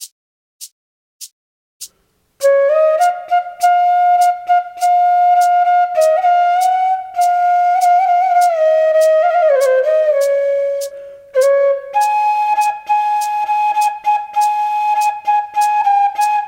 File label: Indian National anthem flute; Bansuri Student Zone